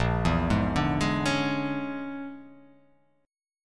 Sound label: Bb 7th Sharp 9th Flat 5th Bb; Bb7#9b5; arpeggiated; JGuitar